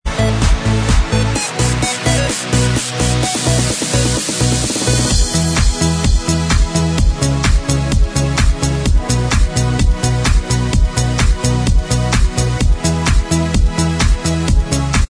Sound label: remix Inst Track